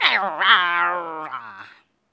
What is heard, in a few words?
small die, voice